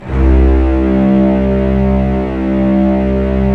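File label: string section; Roland - LA Composer vol. 1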